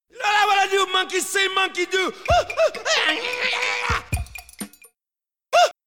Shout ('woo')

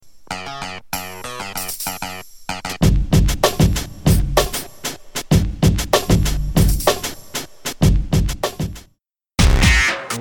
Snare

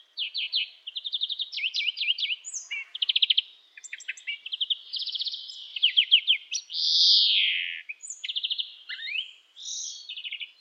Carduelis chloris

